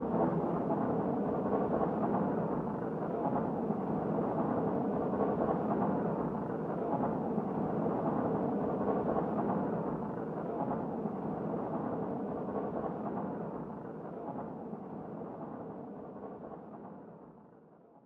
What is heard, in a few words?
Player Hand Slide Roll Fast